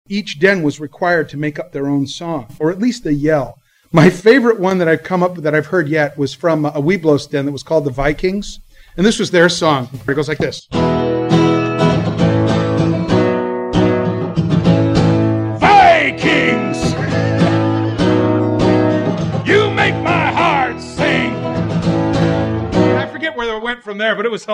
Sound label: Den Yells; CubScouts; PowWow08